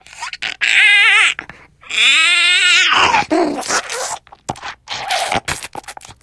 spitter lurk, voice idle

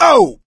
ledge hang slip; voice; source engine; left 4 dead 2; survivor

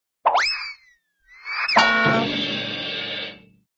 whizz klonk; cartoon; hannah barbera cartoon sound fx

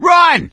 yell run, gambler, survivor voice; source engine; left 4 dead 2

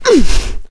melee swing; source engine; left 4 dead 2; producer

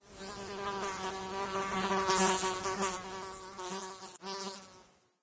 fly buzz, random animals; ambient; Left 4 Dead 2; Source Engine

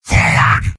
soldier battle cry; mvm

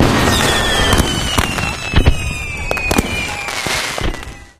summer fireworks; Source Engine; tf

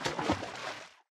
slosh, footsteps, walk; source engine; left 4 dead 2; boomer

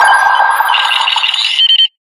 quest status tick expert pda

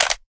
smg clip out; weapons; source engine; left4dead2

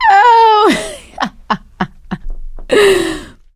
laughter, survivor, voice

